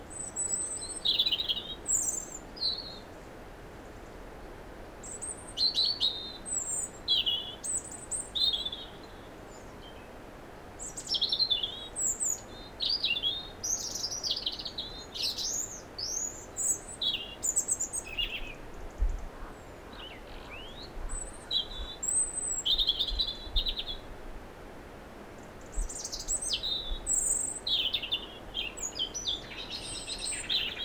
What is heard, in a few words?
Dawn Chorus; Song Meter Micro 2